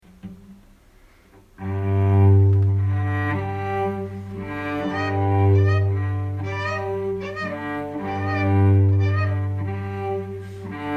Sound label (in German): Fernimprovisation Cello